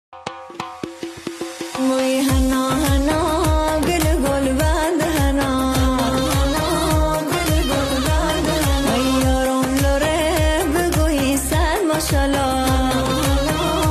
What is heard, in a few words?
Women; صدای زن